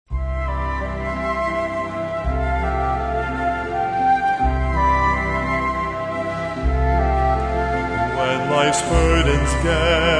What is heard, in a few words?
Trio, Perfect Peace